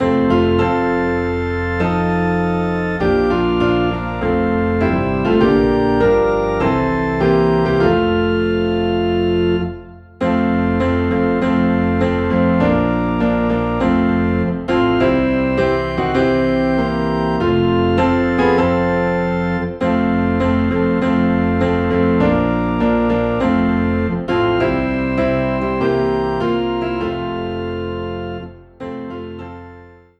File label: Piano and Organ Low in F